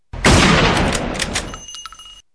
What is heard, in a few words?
karabiner98k, NewGunSounds